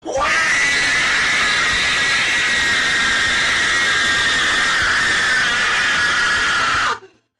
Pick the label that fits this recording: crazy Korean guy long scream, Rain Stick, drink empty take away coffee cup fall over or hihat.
crazy Korean guy long scream